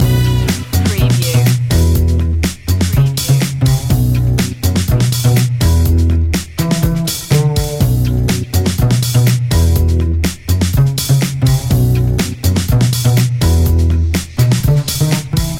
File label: tele fusion loop